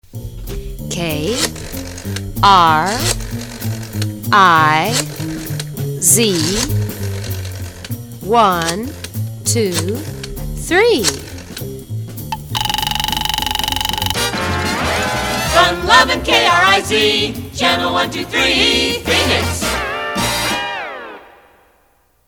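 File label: Gui-Twist Signature - Sonovox, jingle; KRIZ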